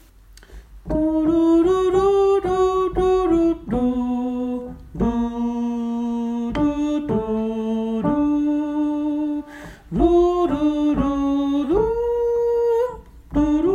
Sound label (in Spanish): Alto